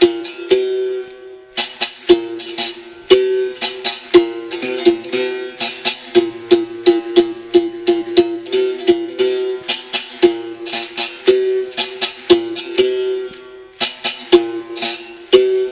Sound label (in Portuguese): Toque de angola